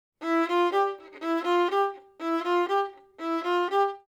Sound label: violin